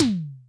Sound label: Electric H, Yamaha DTXpress Electric Tom; drum machine